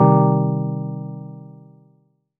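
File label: Cm chord Chilled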